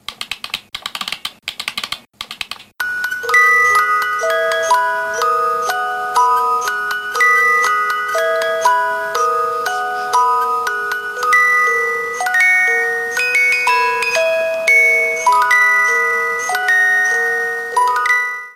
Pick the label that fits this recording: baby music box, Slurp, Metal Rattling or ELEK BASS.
baby music box